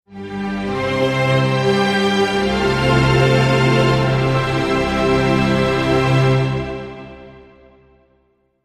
Orchestral Msx Logo

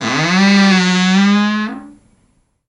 Closet Door Creak

